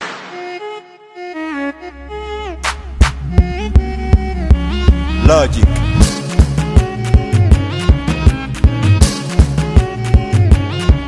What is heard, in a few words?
Beat - Violin Love